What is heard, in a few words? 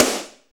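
SD, snare drum, Dance-Kit; 90 s Sample CDs; Sound & Vision - Gigapack I CD 1 (Roland); Dance-Kit 14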